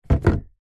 industrial power steering fluid in plastic bottle set down wood shelf